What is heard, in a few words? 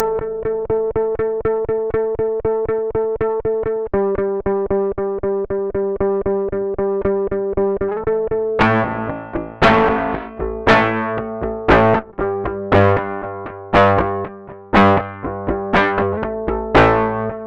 octave clang hits; deathbyaudio